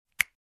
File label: Finger Snap